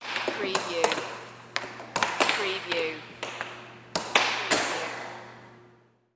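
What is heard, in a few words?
Air Hockey Short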